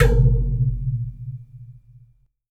JUG HIT

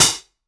Solid Bruiser, Hats; Airwindows

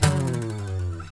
SLIDE, guitar; Spectrasonics; Hans Zimmer Guitars VOL-1; TAKA FX

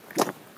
Stony Step, outdoor, Footsteps

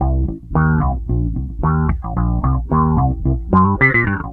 SWEEP 111 C, Bass Grooves; USB Soundscan vol.30; AKAI

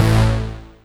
Synth Hit A; Trance; Synth Hits; Zero-G Trance Inducer; 90 s Sample CDs

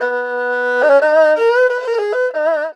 ERHU LICKS

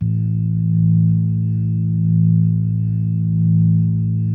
A1, B3

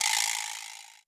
Roland U220 Dry Set

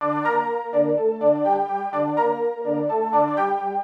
Synth Loop (125BPM), retro house

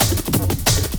LOOP SD, Drum & Bass, 180 BPM

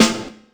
SP1200 snare

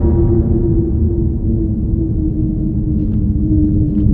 A#3, symphony; Northstar - The Wizard VOL-1

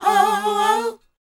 SHOO B A#D, R&B & Dance, Vocal; ILIO; Vocal Planet VOL-4; SHOO BE 80

